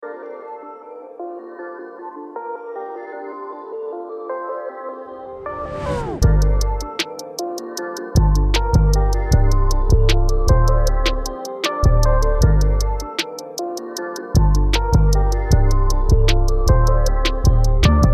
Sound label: Ambient Trap b155